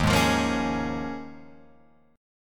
Eb 7th Suspended 2nd Suspended 4th Eb down Guitar Open F, Eb7sus2sus4 chord; strummed; JGuitar